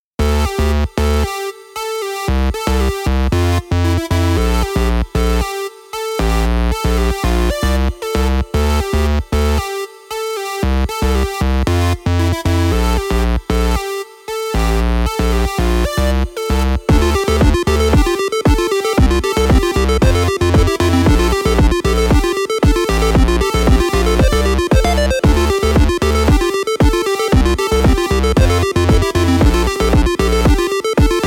dance with me robot girl korg dsn 12; weeklybeats-2018

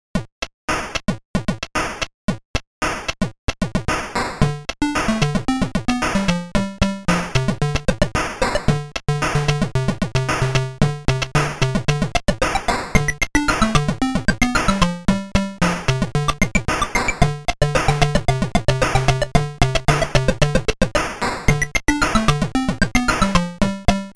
funky beat psg; weeklybeats 2024

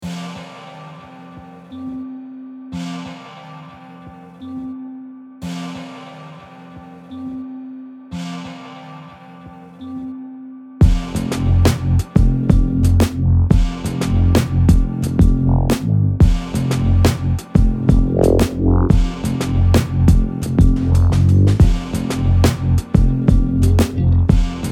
jazz hop; weeklybeats-2018

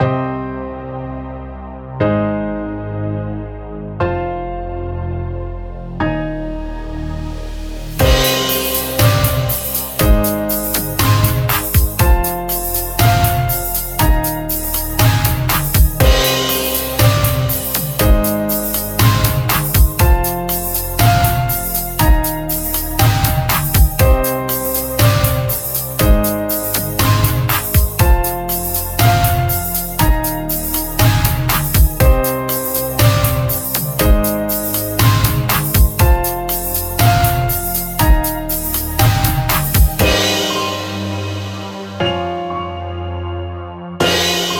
melodies melody

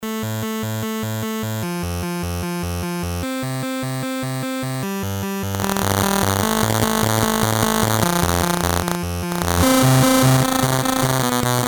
weekly beeps; weeklybeats 2012

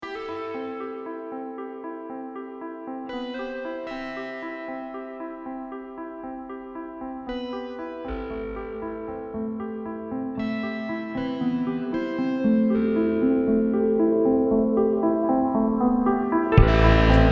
low battery drift; weeklybeats 2026

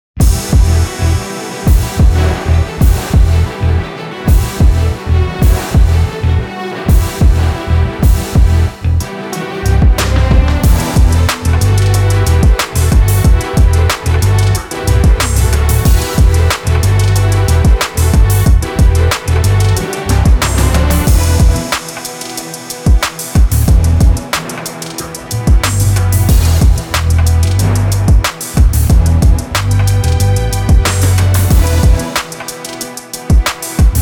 fight beat

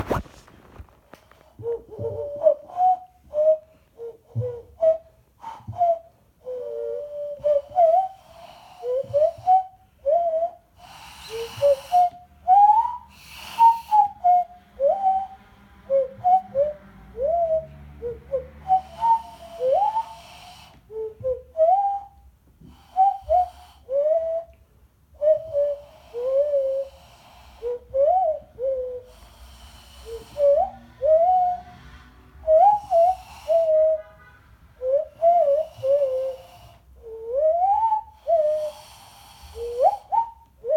handflute jam; weeklybeats 2024

